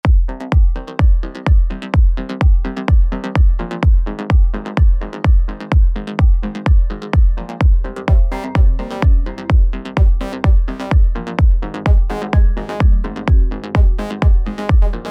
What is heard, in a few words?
127 kick bass atm Am WET